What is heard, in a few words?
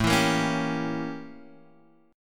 A 7th Flat 9th; A7b9 strummed; JGuitar